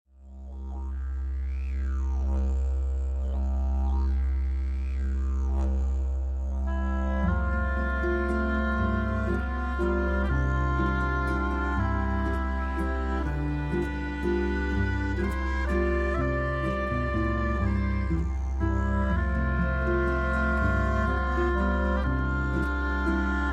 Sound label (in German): walzer; crossover experimentell